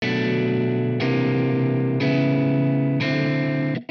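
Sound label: amp guitar chords, Hybrid neural guitar overdrive; souldriven; Morphdrive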